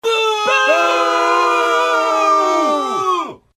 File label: Boooooooo